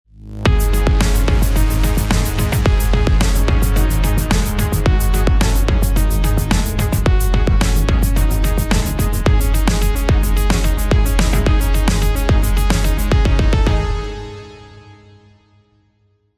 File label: win theme; roblox rivals